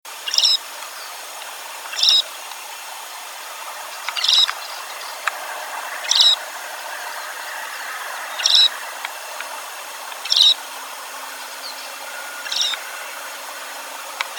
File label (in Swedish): Varfagel sydlig